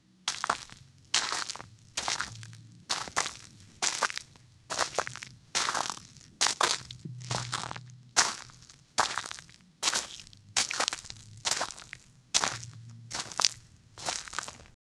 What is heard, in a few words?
very slow walk footsteps